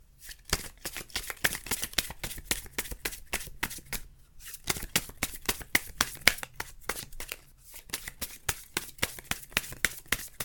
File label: deal cards